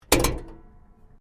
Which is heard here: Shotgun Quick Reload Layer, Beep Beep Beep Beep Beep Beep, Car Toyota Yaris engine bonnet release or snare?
Car Toyota Yaris engine bonnet release